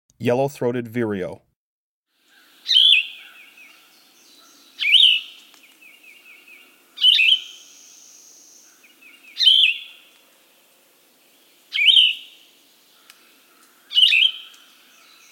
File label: Yellow-throated Vireo, Birds of the Chicago Region